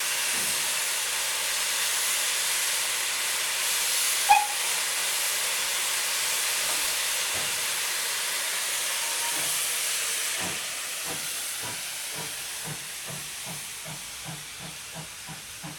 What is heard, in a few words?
Steam engine chugs off